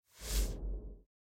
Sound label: Rose Window; Blind Quest - The Frost Demon